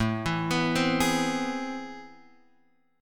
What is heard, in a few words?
A Minor Major 9th, down, Guitar, Open F; arpeggiated; JGuitar